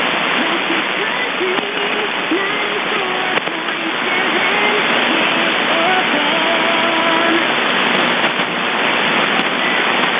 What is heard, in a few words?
country; WBCQ Monticello ME relay WBCQ-FM 94.7 KIXX